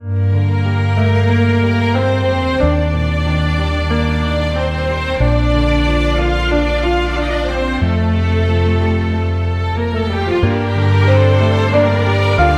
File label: Music Ringtone